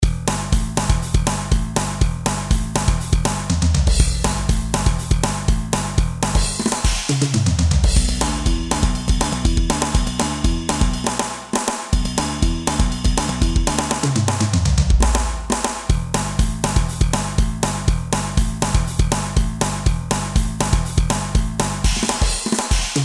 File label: dr 670 punk bass